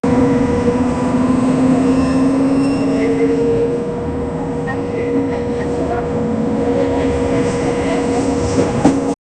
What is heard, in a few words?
sound of bus terminal, announcements